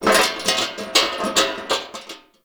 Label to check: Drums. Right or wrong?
Wrong